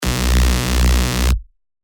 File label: bass shot B; Carbon; revealed bass shots vol 4